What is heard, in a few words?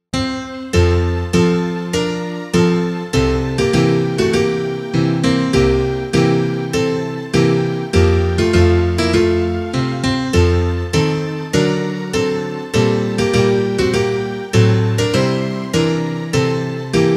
instrumental